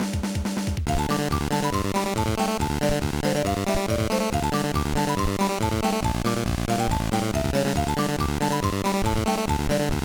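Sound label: background music; No No No Puzzle Chailien; GBA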